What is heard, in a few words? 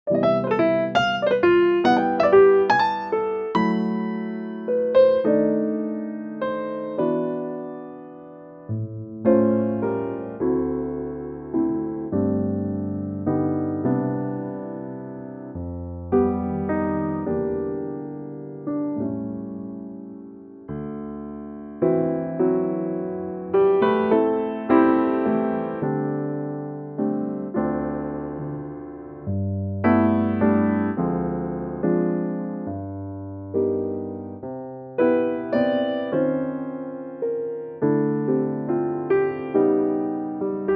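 piano C, male; key - C - vocal range - A to D; Wonderful old standard in an intimate piano only arrangement.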